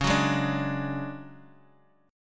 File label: D Major 7th Flat 5th Db, chord